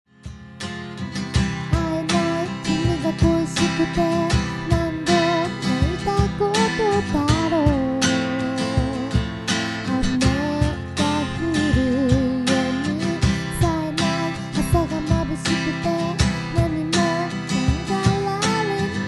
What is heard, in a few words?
music